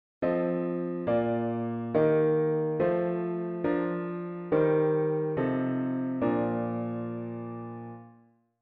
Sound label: diat inv V7 inv bass